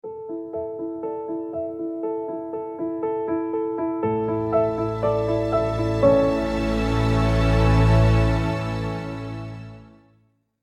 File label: outro music stem